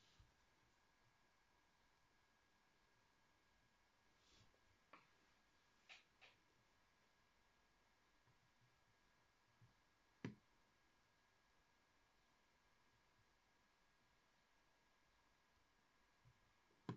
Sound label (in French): cheval